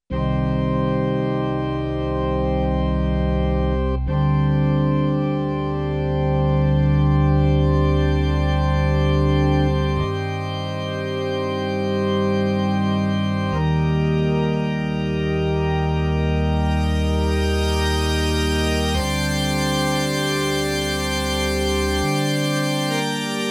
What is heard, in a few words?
Pipes Knobs